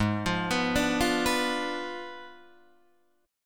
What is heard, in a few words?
G sharp Diminished 7th G sharp, Guitar Open D